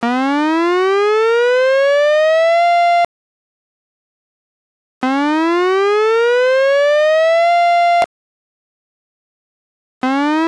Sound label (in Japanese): sairen